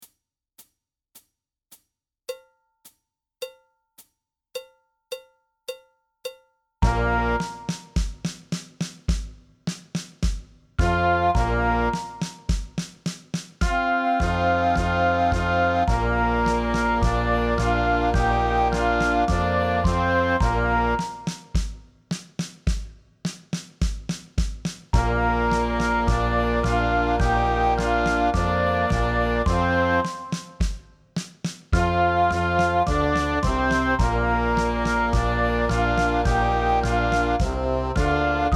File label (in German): Playback langsamer